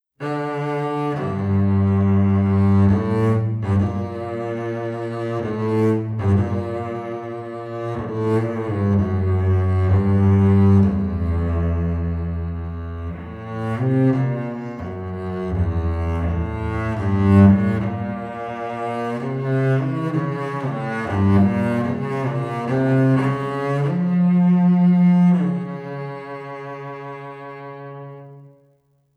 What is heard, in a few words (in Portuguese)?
Contrabaixo; Conhecendo Instrumentos Musicais